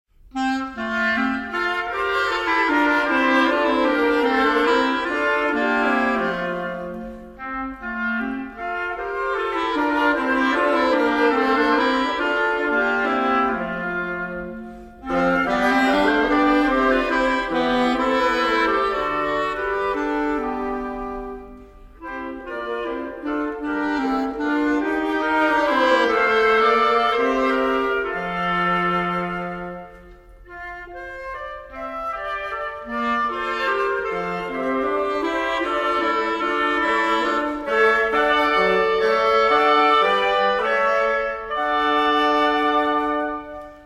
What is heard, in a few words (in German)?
Holz; Three Easy Quartets; The Angels Greeting; Hunter